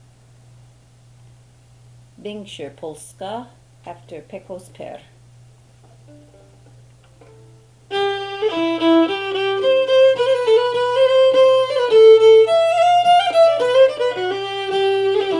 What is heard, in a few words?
Polska